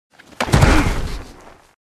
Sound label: American football tackle grunt